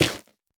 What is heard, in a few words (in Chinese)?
菌岩破坏声